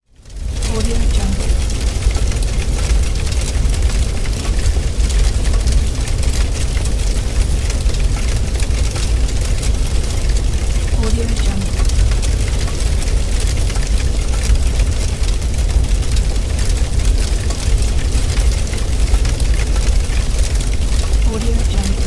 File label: fire crackling blaze